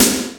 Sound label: CRACKED SN, Techno Trance; E-MU Formula 4000 Series Vol. 2